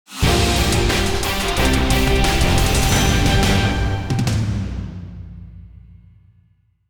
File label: Sting Positive; bakugan champions of vestroia 2020; switch gamerip